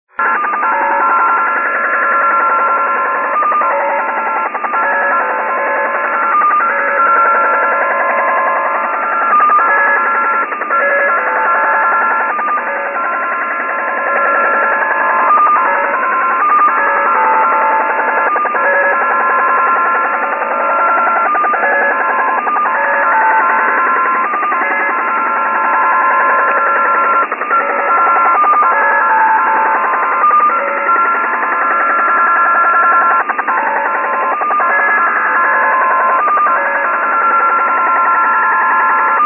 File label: 8640MHz